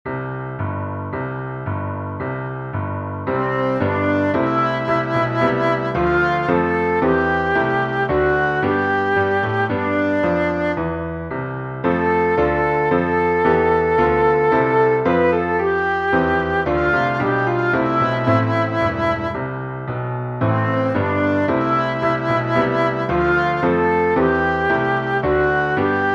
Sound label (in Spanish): Himno de Andalucía, Flauta Violin Oboe